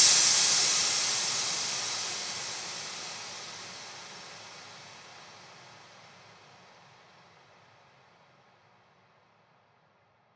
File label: Hat Crash; Psytrance; FX